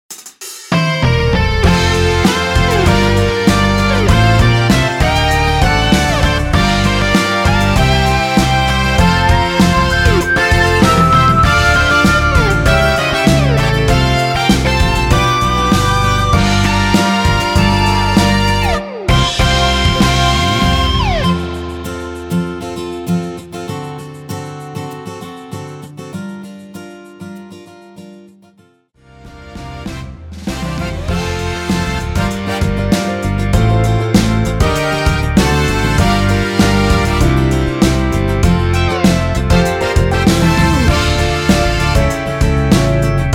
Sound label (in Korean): MR